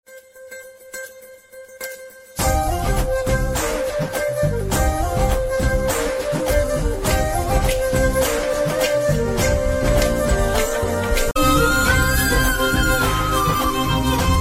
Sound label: love tune ringtone, guitar for love instrumental